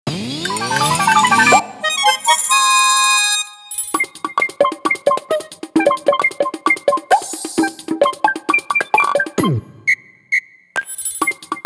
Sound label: Accomplishment Fanfare